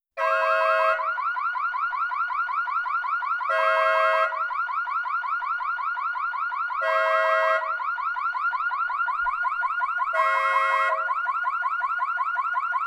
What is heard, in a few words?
Car Horn With Alarm; Vehicles